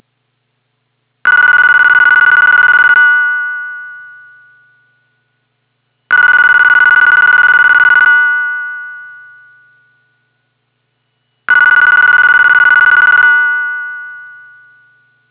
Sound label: ringing, phone; HL1-Mecklenburg